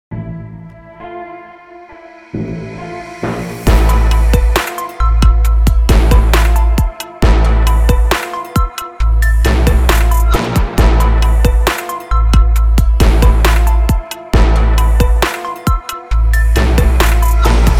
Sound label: TRAP BEAT FLOW